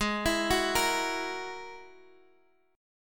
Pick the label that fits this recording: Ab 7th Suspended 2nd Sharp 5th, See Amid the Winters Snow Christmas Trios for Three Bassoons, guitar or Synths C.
Ab 7th Suspended 2nd Sharp 5th